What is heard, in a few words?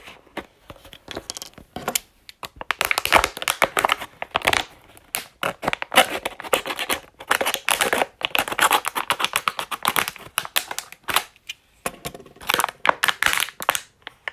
Objects Ensemble